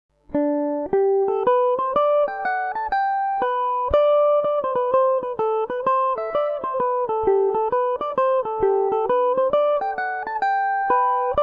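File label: hornpipe G major revelation, irish mandolin; The Glenbeigh Hornpipe; Revelation Jaguar electric mandolin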